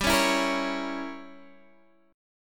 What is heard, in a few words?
A sharp Diminished 7th G